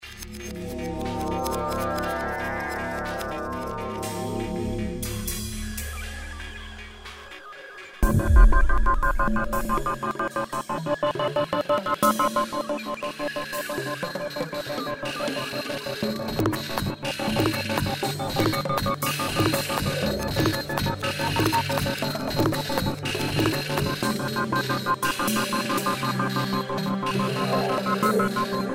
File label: soundscape